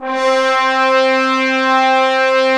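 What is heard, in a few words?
trombones; Roland LA Composer vol. 4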